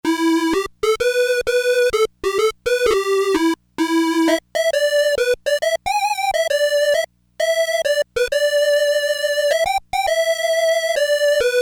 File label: Solo Synth - Square Wave solo, Crumar Trilogy